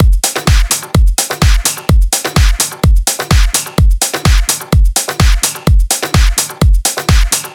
127BPM Drums; Vengeance Samples; Rebound Kit

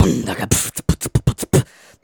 Beatbox Loops, Human Beatbox

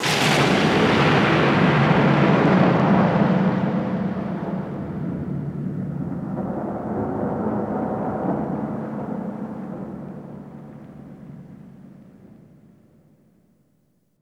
VFX Atmos

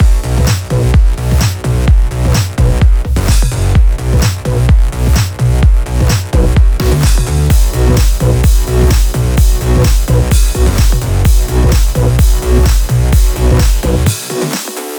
128BPM Neo Pop Mix

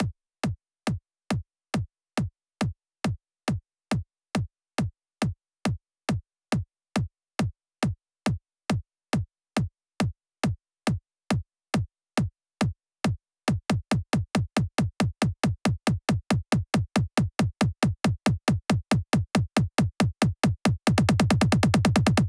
Kickdrum Fill 138 BPM; Drums & Perc; Bminor

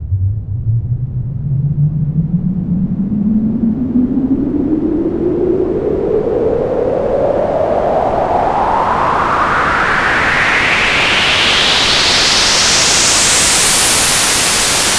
FX Uplifter

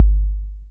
Bass Drop, 808; Street Anthems Sound Kit

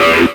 Synths E; vengeance essential club sounds-3

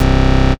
Bass C, Offbeat Bass

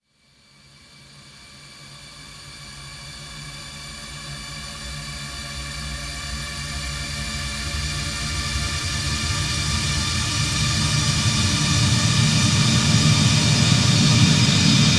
128BPM Orch Rev 8Bars D, Melody Fills; Cult; Vengeance Samples

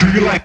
Vocal Phrases; Vengeance Samples; Vocals

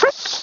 LoFi Vocals Oneshots; Vengeance Samples

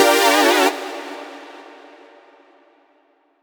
Synth Shots; Vengeance Samples

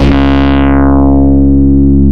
Vengeance Ultimate Bass C#, Root C#

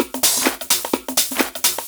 Houseloops 128BPM; Vengeance Samples

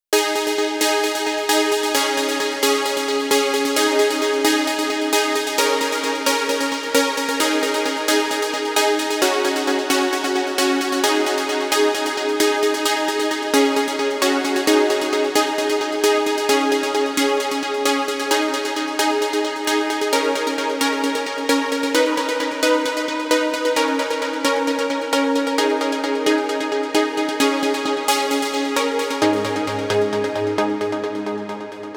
132BPM Mainlead Outro, G#major; Vengeance Samples; Jericho Kit

